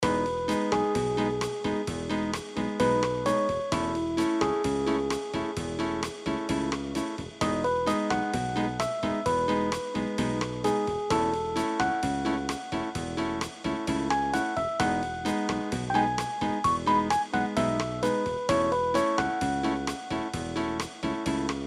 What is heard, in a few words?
elevator